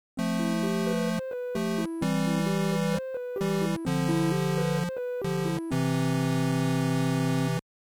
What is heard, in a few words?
Retro 16 bit or 8 bit game over tune